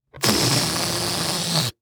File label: Cartoon Balloon Deflate